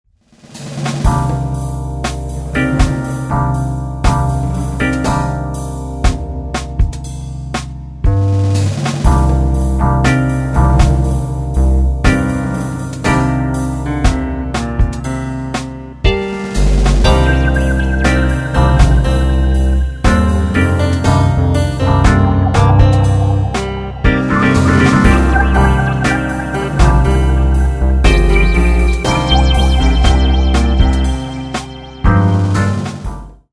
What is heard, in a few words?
slow jazz